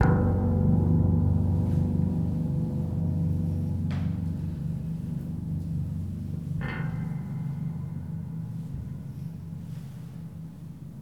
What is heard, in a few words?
single hit single string low; Cinematic Metal Impacts